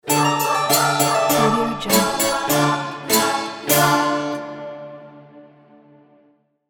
halloween intro with children choir

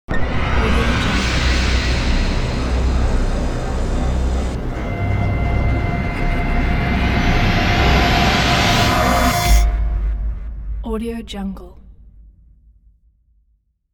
cinematic horror ident; kipaloops

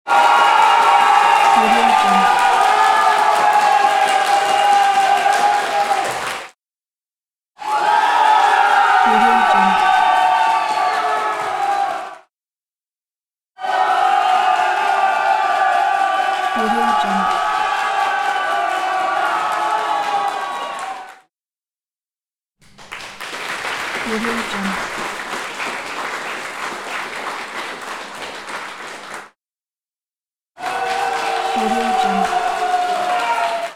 applause and cheers

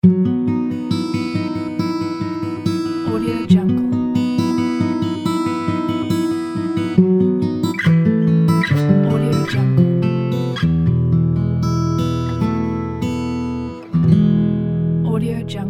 logo ident opener pack

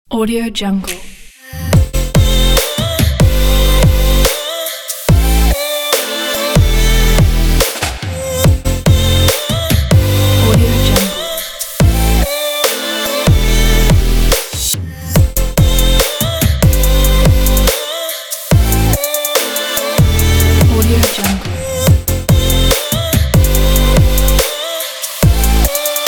the modern future bass

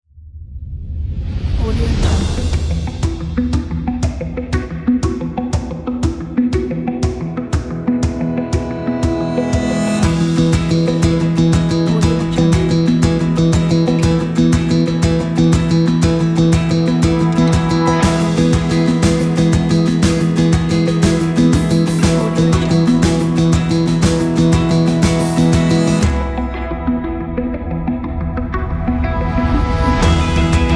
indie corporate rock

